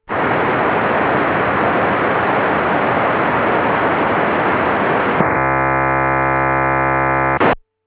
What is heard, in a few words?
p25 undecoded; apco25